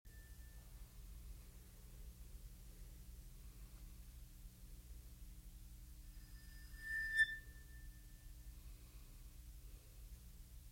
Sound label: Percussion